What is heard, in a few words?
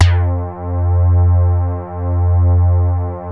CAT HARD F3, DIGITAL BASS; Music Store - Claudius Diemeter Schwerlos